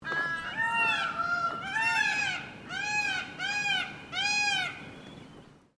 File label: Bird Peacock Mixed Call; Peacocks in Victoria and South Australia; Animals